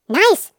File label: Mouse Squirrel Cartoon